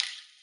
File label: Misc Percussion Clavas